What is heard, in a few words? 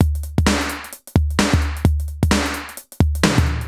Alesis HR-16B (130BPM), beats